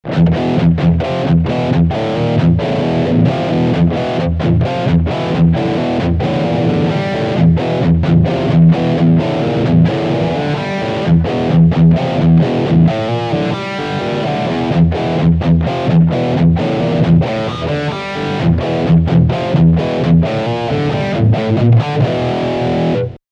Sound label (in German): Riff beide Humbucker